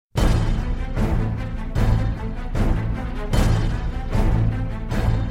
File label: pro battle, bgm